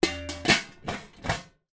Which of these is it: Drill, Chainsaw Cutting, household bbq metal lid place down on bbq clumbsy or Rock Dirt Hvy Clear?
household bbq metal lid place down on bbq clumbsy